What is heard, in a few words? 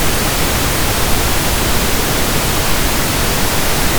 pink noise